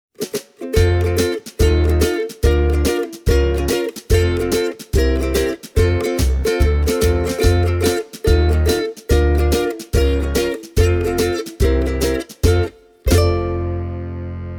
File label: kokio mahogany concert